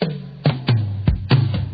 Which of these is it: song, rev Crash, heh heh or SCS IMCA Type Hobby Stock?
song